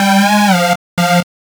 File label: Synth, Trip-Hop Loops; Sonic Foundry (Sony Creative Software); Rondo Brothers Trip-Hop Loops & Samples